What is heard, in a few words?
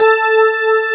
DIDI, SYNTHESIZER, DIGI WAVE